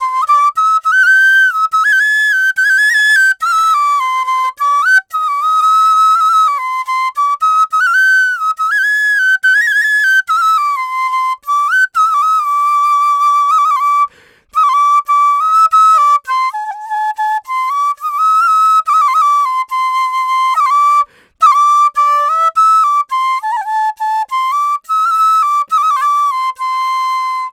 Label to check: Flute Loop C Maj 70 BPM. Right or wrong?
Right